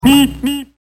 beep beep

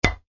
strike ball

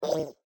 Demon

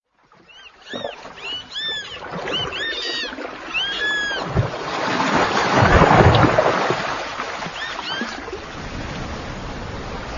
Waves Splashing on Boat Seal Barking Near By

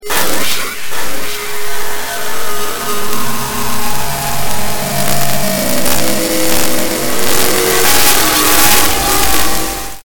ocean

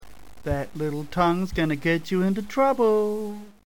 tongue

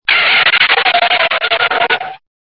skid

